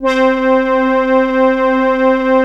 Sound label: SIEL, String Machines